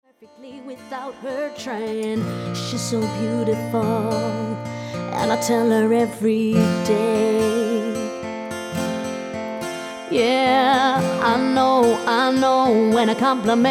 acoustic

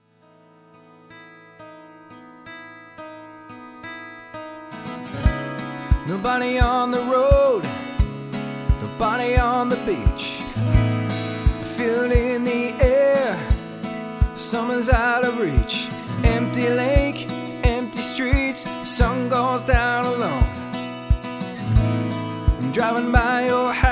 Solo Acoustic

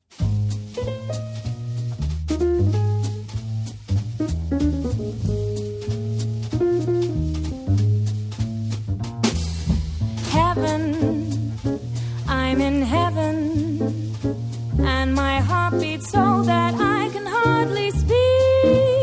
Jazz Band

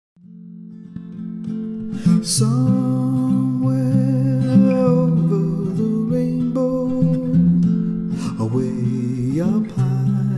Solo Acoustic; English/Jamaican solo acoustic musician

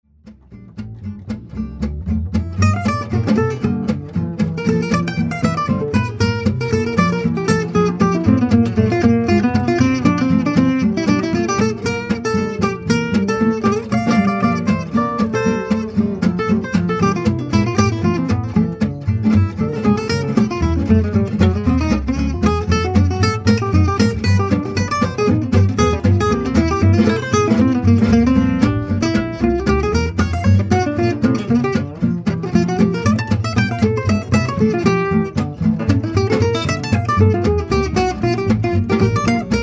gypsy swing

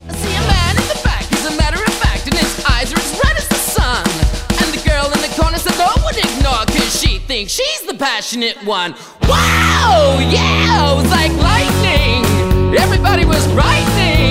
Corporate Party Cover Band

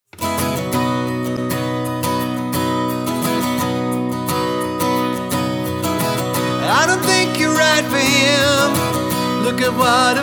solo

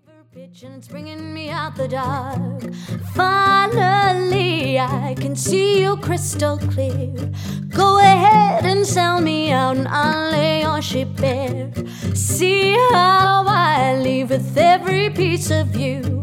acoustic

